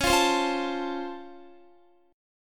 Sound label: C sharp Augmented Major 7th, Guitar; strummed; 4F,4A,5C,5G,5C,6E; C#+M7; JGuitar